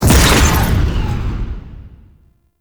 Immunode Explosion; Gameplay; Warframe; Veilbreaker; Devourer Kahl

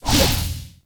Scythe Charge Swing Whoosh; Weapons; Melee; Warframe; Archwing; Exalted Archwing Scythe

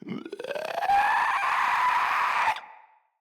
Infested Runner Chasing

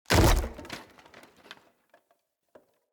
Barrel Wood Hollow Medium Destroy

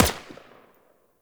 Minigun Fire; Warframe; Venus; Venus Gunner Spaceman Agent

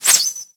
Arrow Whizzby; Warframe; Bullet Impacts; Whizzbys

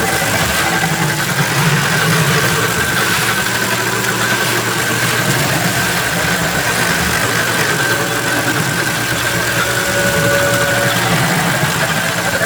Laser Loop; Amalgam Carrus; Abilities

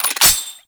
Spear Gun Reload; Hoplite

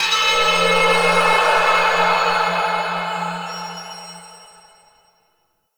Breathy Bell; Warframe; Entrati

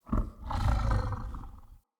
Battle Dog Relax, Animals; Vocals; Snc Battle Dog

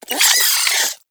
Vocal Death, Hunting Critters; Nexifera

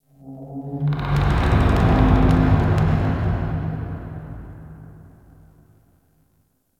Wooden Creak; Warframe; Ambience; Entrati

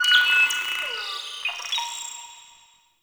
Class Lesson Slide

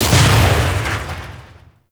Proj Explosion; Warframe; Archwing; Kuva Grattler

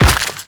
Step Dirt, Footsteps; Characters; Warframe; The New War; Kahl

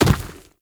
Infested Medium Land Dirt, Footsteps

